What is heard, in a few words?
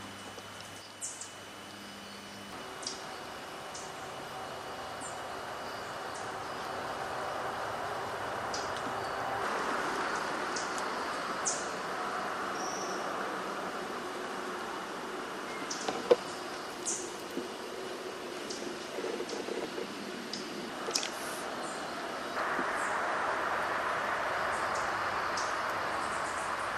yellow backed tanager